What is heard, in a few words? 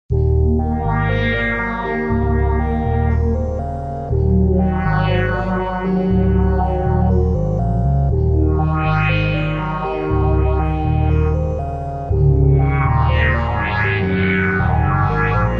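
wavetable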